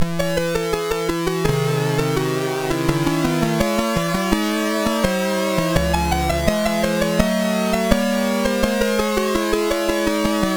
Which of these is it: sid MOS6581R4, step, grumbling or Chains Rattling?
sid MOS6581R4